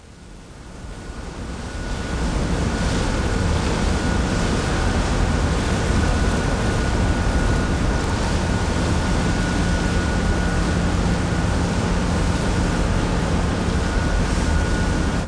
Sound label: ferry; WAV Sound Effects; transpo; sea